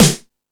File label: Rock N Roll, E-MU Pro-Cussion